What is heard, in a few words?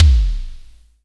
KIK SIMM K, Analog Kicks; Roland L-CDX-01; Analog Drums